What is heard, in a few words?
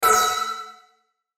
chime flange warble; Multimedia; Chimes; Blastwave